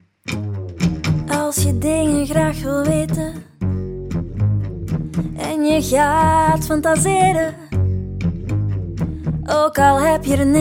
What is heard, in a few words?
blues